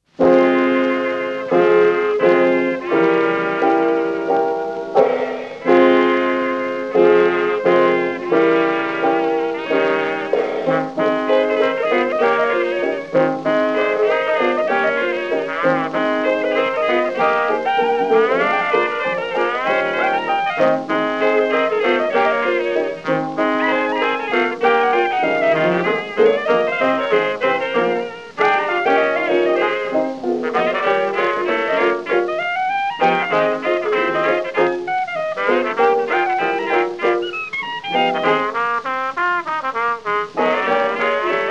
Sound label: blues